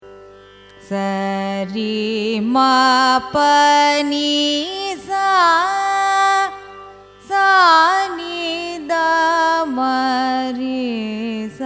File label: raga andolika arohanam avarohanam; carnatic music